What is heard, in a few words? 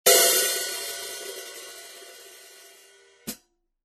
HH 14